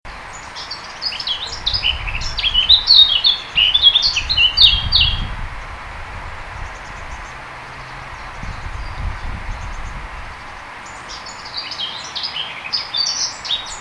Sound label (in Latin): sylvia atricapilla; vogel; hecke; biologie